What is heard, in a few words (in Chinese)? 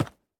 山羊脚步声